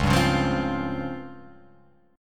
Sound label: C sharp Major 7th Flat 5th, chord